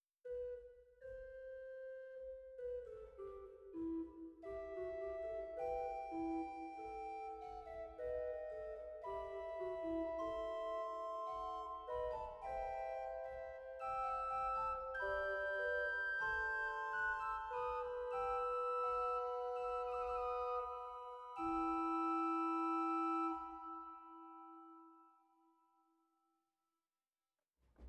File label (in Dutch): Rugwerk Fluit 4; zwolle